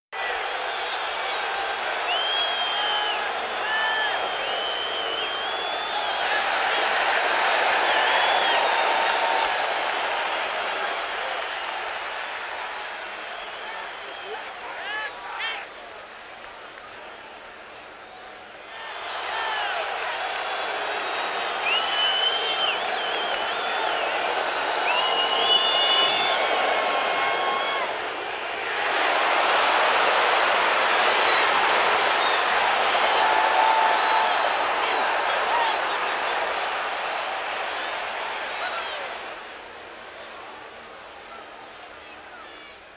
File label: Football Crowd Rowd, sports arena football